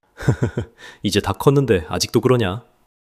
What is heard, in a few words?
laughter